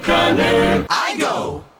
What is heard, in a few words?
Jingle